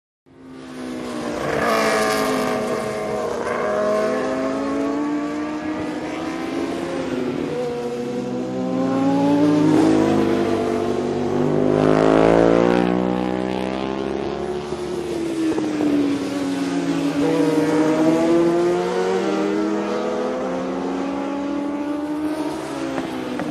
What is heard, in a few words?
Motorcycle Road Racing